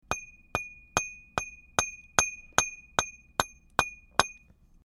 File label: hitting bottle